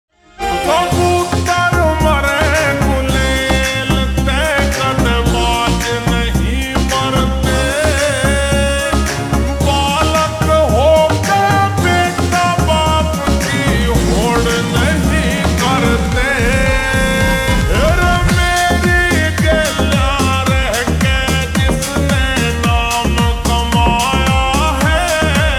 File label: Ringtone Slowed Reverb